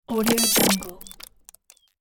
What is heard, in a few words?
radio receive alien glitch; futuristic; اختلال در تماس فضایی